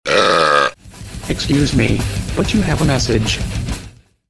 Burp Message

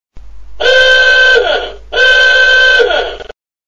Old Car Horn

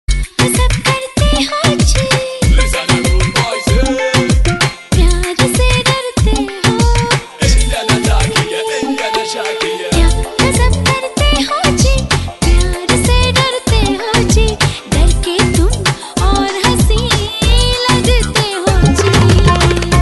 Bollywood Remix Song

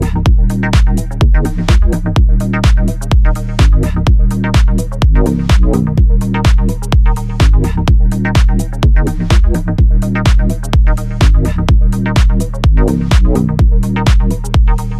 Drop Beats

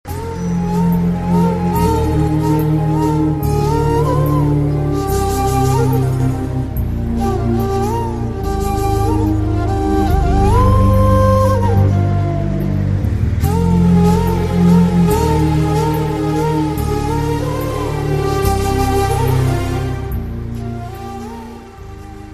Cute Rhythm

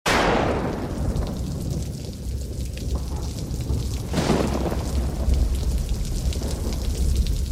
Rain Storm

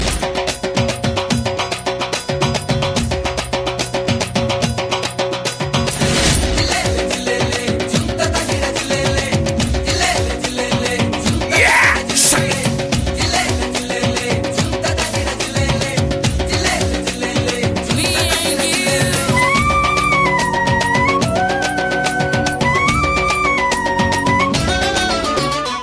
Dhol

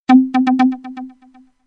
Subtle Sms

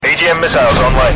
Missile; Agm

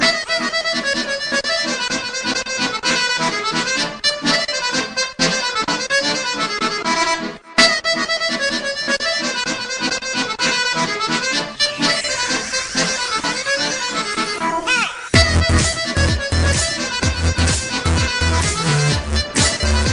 Tehno Harmonikaaaa

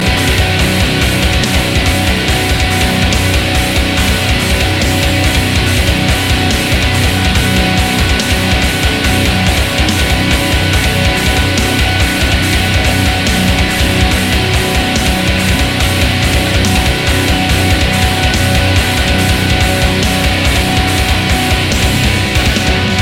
metal riff

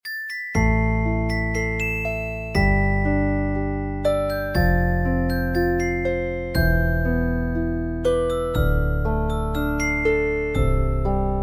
Soft Love Ring

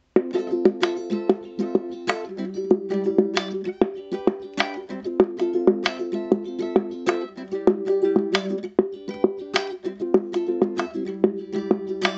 Uke

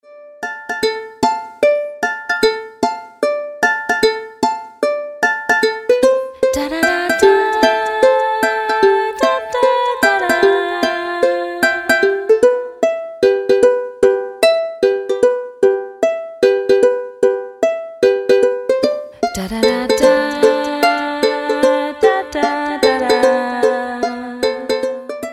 Ukulele

